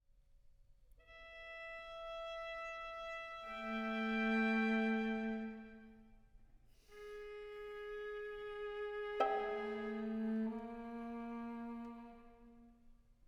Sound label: String Quartet; Gudmundsen-Holmgreen; Complete String Quartets, Vol. 1; String Quartet No. 3 ''5 Short Studies''